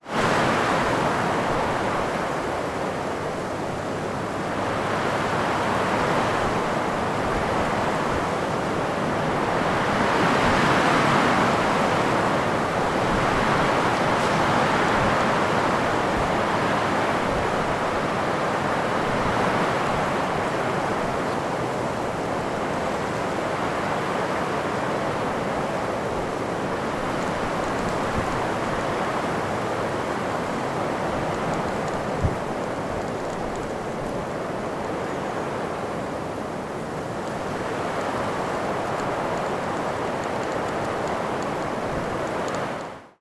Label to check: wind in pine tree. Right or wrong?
Right